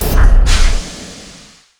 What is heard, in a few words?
drop; allegiance